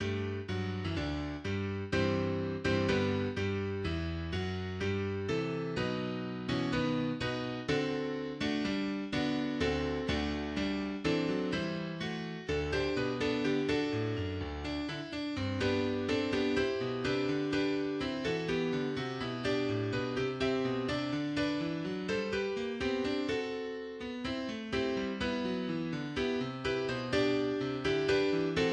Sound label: Piano; Elysia